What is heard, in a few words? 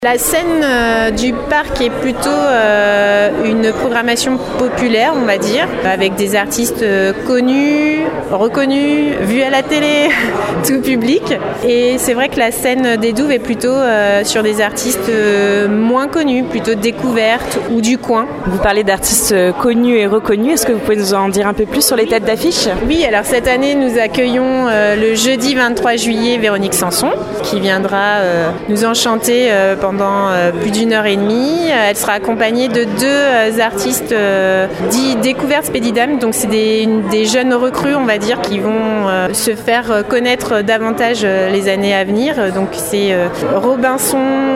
brass